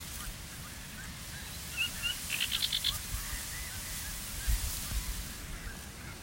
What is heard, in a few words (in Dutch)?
Rietvogeltje onbekend